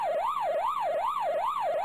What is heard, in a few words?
ghosts movement; Pac-Man